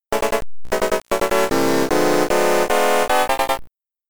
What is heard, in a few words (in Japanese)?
予選ゴール・ミュージック(ポールポジションでないとき)